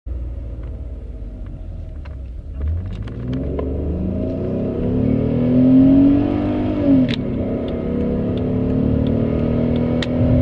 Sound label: Xantia Break